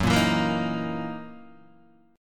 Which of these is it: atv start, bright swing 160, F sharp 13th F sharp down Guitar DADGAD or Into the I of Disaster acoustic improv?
F sharp 13th F sharp down Guitar DADGAD